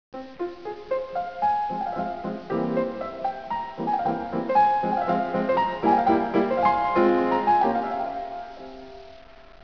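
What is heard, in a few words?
beethoven piano sonata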